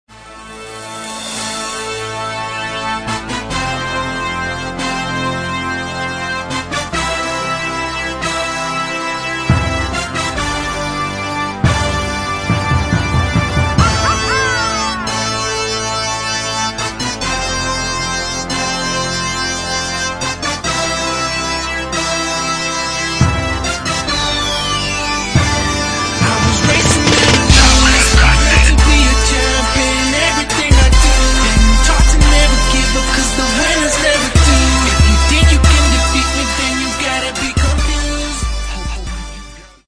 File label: hip hop beat with hook